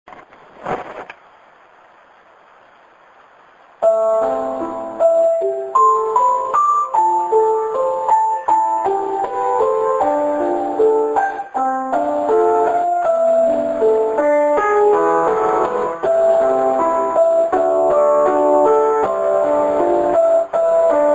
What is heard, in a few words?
piano